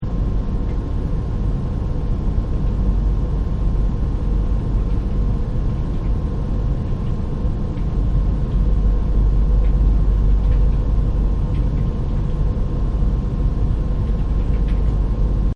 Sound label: Room Tone Air Con Typing; Partners In Rhyme